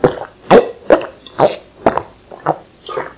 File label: drinking male